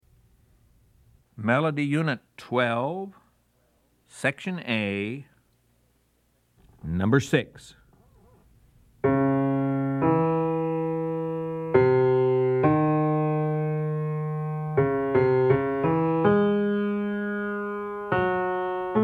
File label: melody, ear training recordings